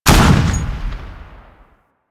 Heavy Pistol

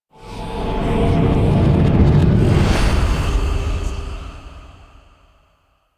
Cave Undercroft Intro Stinger; Duviri

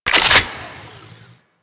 Rail Jack Rifle Reload; Warframe; Tenno

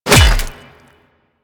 Melee Hammer Impact Flesh

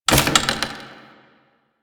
Tower Branch Movement; Warframe; Quests; TheNewWar; Ambience; Cetus; Unum Tower

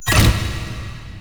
Vaults Stairs; Warframe; Entrati

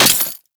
Melee Impact Glass; Warframe; Weapons

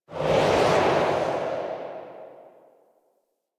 Reaper Travel Whoosh Left; Warframe; Wraith

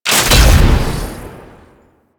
Turret Fire; Warframe; Duviri; Duviri Turrets

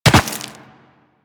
Land Rock, Footsteps; Warframe; Tenno

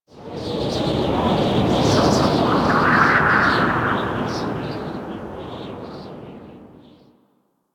Exterior Wind Gust; Warframe; Ambience; Duviri

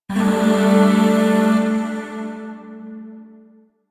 Shawzin Pent Maj Chord J; Music; Chords; Warframe; Zariman Void Shawzin; DE

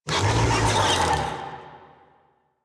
Infested Big Ashen Vocal Attack; Warframe

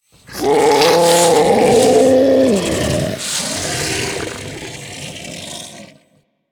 Idle, Infested; Enemies; Barks; Warframe; Ancient Shell Agent

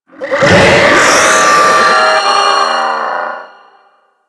Pet Vocal Call; Enemies; Vocals; Warframe; Alad V Pet

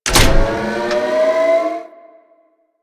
Asteroid Drill Machine Pull Start; Warframe; Ambience; Grineer Asteroid; One Offs

